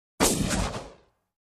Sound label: Bazooka Firing Gun